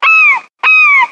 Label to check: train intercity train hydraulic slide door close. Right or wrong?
Wrong